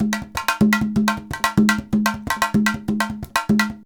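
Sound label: emelabo grooves; Best Service; World Colours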